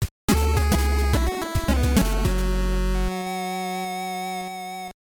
Game Over, NES; Lethal Weapon

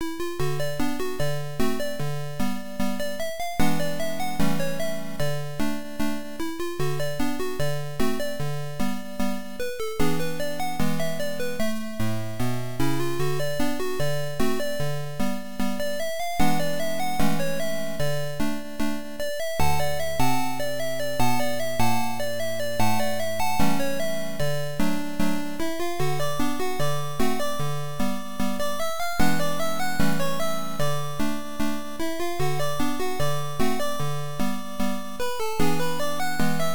The Entertainer Piano Version; Creative Music System; DOS

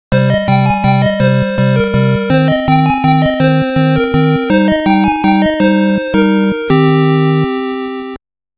Game Over; Loopz; NES

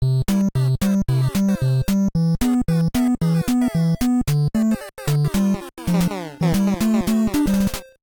Title BGM; Excitebike; NES

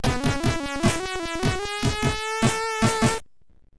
Fanfare, C64; RoboCop

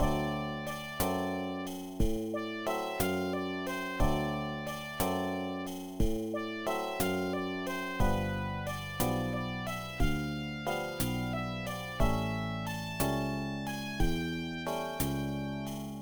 Blues; AdLib Visual Composer; DOS

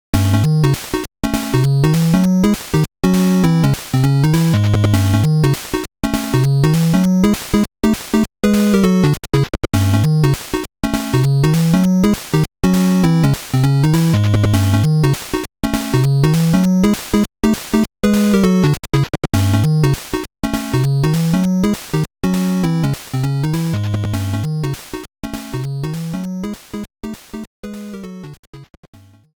Stage Theme; Happily Ever After; NES

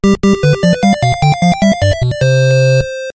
Victory, NES; T&C Surf Designs

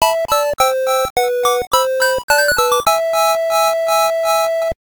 Game Over, NES; Little Nemo

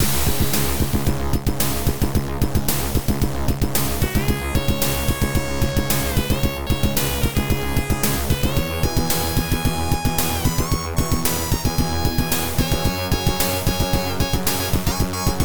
Rocket Bar Music; Space Quest 1 VGA; DOS

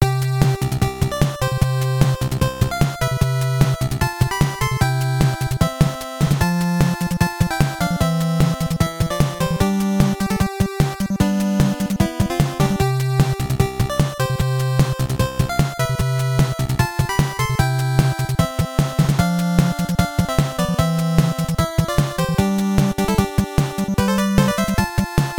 Victory; Contra; NES

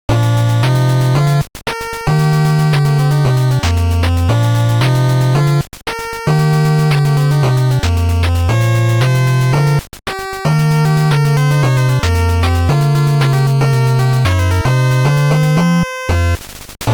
Next Race; Turbo Racing; NES

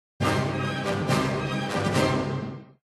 Fanfare; Heroes of Might and Magic 2 Succession Wars; Desert Tent

